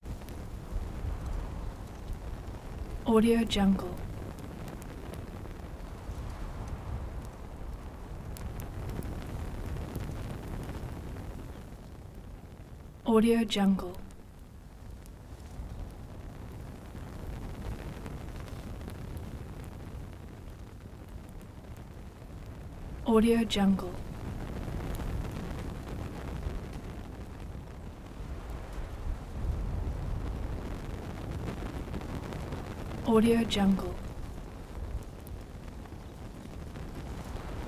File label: wind whipped fire